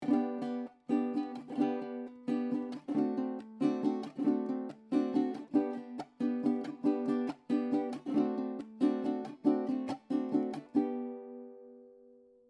Pasaje Sol Mayor

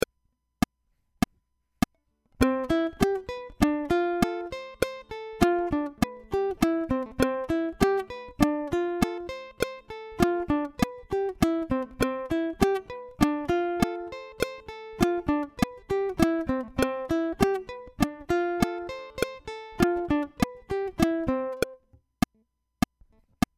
C Escala Click

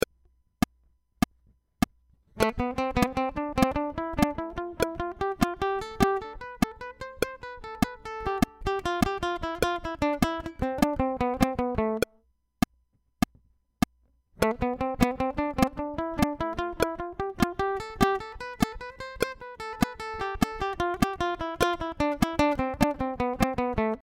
C Escala Tresillo Back Click

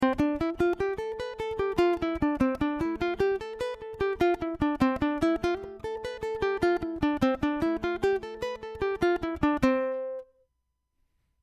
C Escala Completa Back

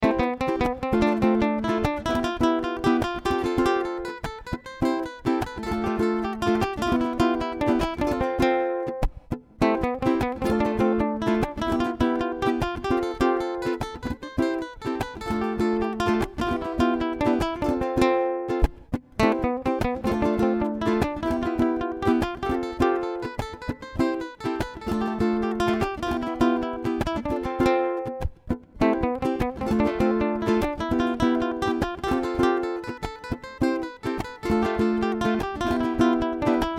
Melodia Ejercicio

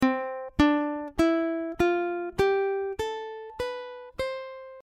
C Escala Despacio

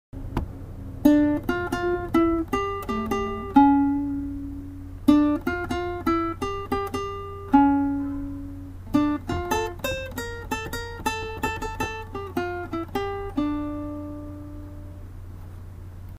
punteo arriba

